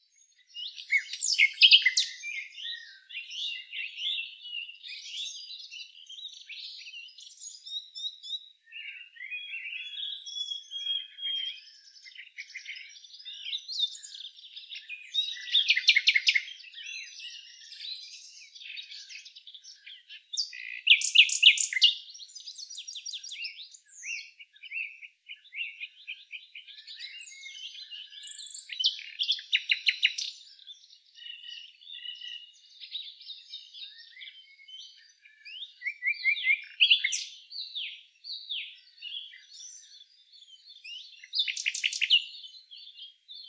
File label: Luscinia megarhynchos; SETE